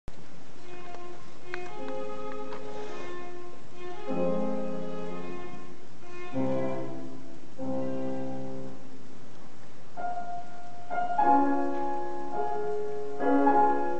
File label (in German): Sonate F Dur fur Klavier und Violoncell Horn Poco adagio quasi andante